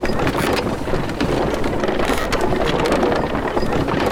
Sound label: Trebuchet Run; Sid Meier's Civilization 4 Complete; Warlords; Units